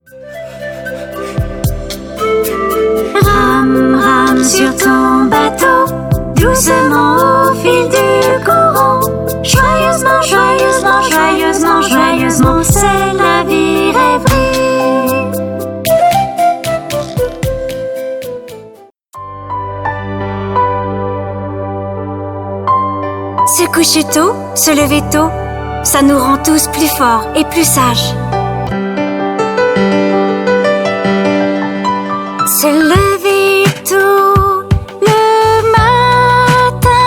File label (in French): chansons enfants; ChuChuTV